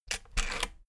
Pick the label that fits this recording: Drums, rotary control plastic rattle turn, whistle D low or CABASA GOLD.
rotary control plastic rattle turn